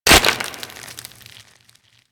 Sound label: Infested Dart Pistol Mech; Warframe; Weapons